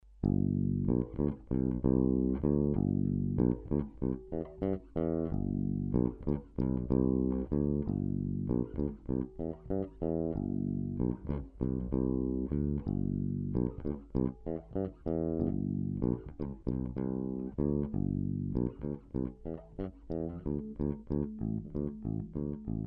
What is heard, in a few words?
1992 Thunderbird, gibson bass